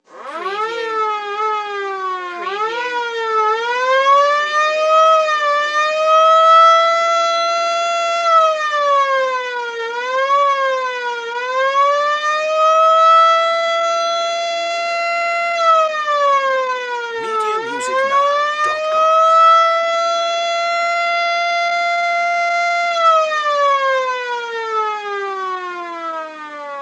Ambulance Siren Vintage

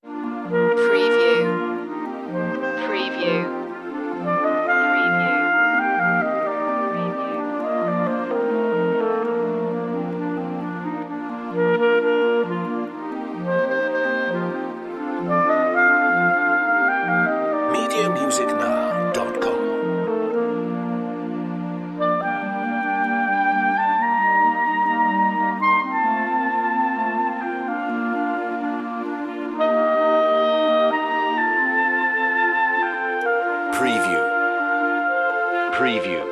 classic clarinet

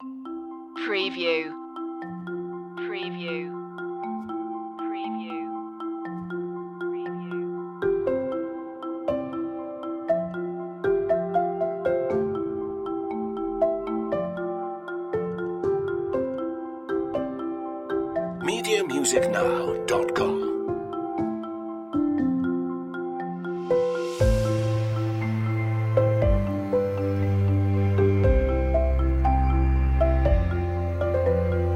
simply joyful less lead

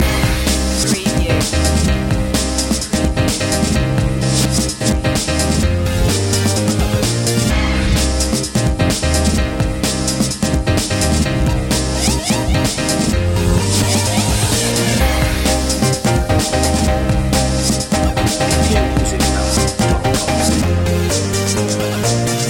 bass addiction loop

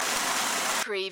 waterfall loop short

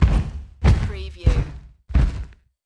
FOOTSTEPS LARGE MULTI

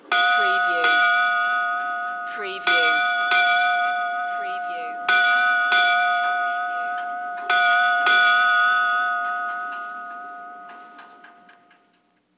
Ship Bell 8 Rings Vintage

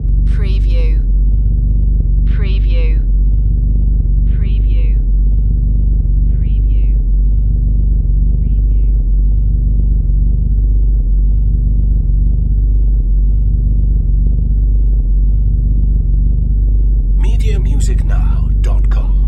Trrfyng Bass

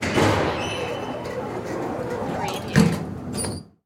Office Building, Elevator Door Close

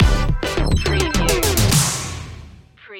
beat machine stinger

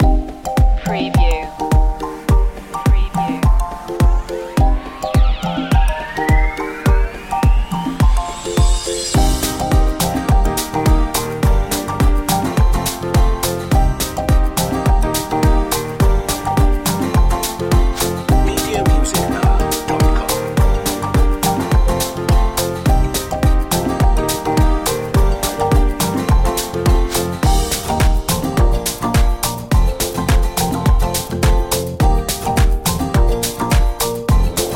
island beats underscore

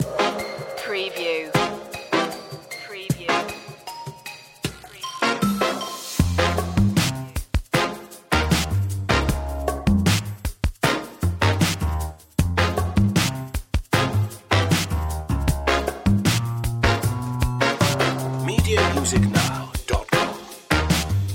dramedy street

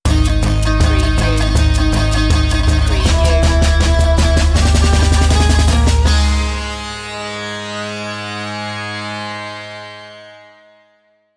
eastern pulses stinger

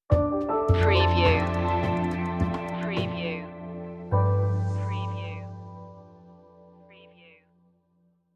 emerald cascades stinger

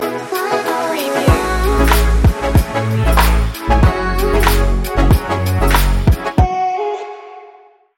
pop stinger

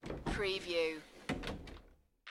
Door Closet Open

